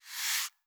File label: Soft Slide Short